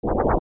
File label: Move; Leever; zelda; OOT